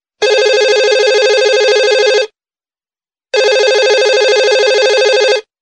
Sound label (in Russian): zvonok stacionarnogo telefona; muzyka dlya budilnika